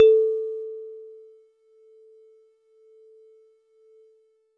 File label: Warble Celeste DX100 A-1